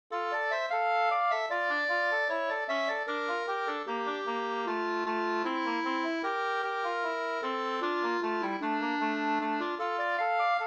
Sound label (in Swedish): vals; Visslanvalsen; William Nyberg